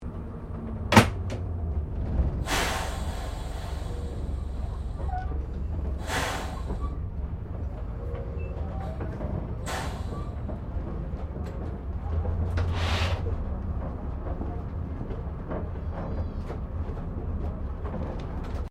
Bus Double Decker Bristol VR Interior Slow Maneauvering; 1971; Bellarine Tourist railway vehicles; Busses